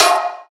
trap steel snare drum sample f key, Royality free acoustic snare sample tuned to the F note.; snare-drum-samples